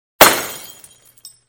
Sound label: Art Glass Break; SOUND EFFECTS1